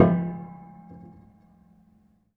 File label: Harm Low Short, Piano Harmonics